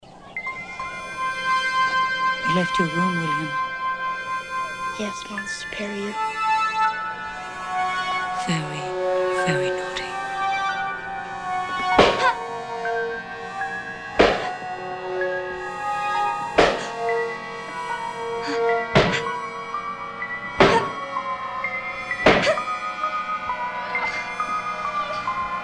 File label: Jingle Jam